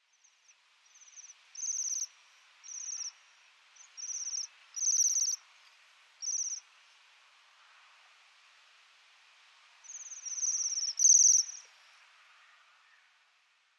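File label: Bohemian Waxwing; koe; kirenjaku; setana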